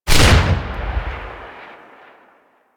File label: Glass Shotgun Shot B; Warframe; Tenno; Weapons